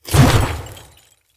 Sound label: Claw Swipe; Bromius